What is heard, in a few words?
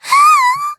shock; rik